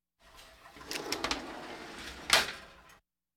Hospital effects

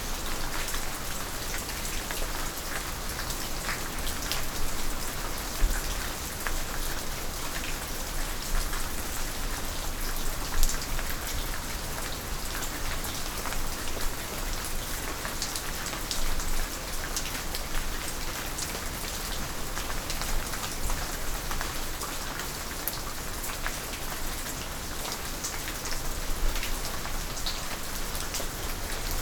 Rain aftermath at cave entrance drippy; boom library; Thunder And Rain

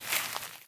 grass step, footsteps

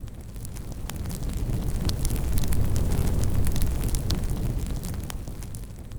Low Flames Loop

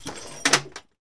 Plane P40 Lever; Airplanes

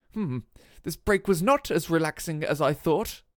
Lose; Pitch Black Audio Pong

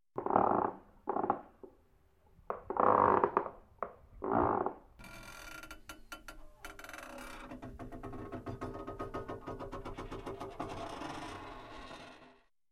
Creaks, Scary Sounds; 1001 Sound Effects; Halloween Horror Sounds Effects

